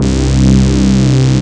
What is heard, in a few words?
BAS, Eurotech; Sounds Good